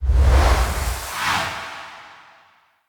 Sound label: Decoy Cast; Fey; Saboteur